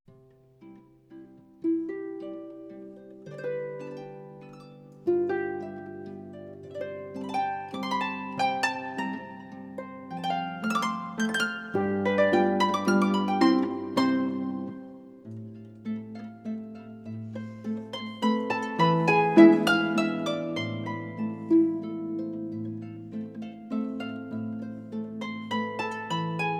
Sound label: Rossini Allegretto per arpa